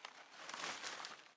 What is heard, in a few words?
ambience room shadow room working layer